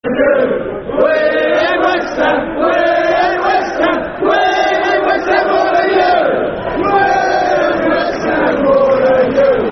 Football Chant (Soccer Song); We Hate West Ham More Than You; Leyton Orient Lofc

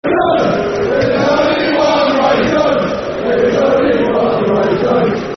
Football Chants; Ray Jones; Queens Park Rangers Qpr

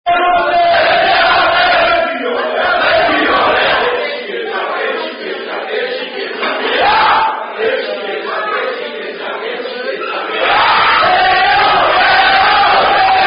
French Rugby Chant; Efsc Chant

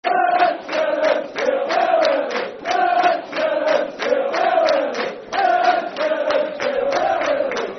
Football Chant (Soccer Song), Hibernian; We Are The Hibs; Hibernian Hfc

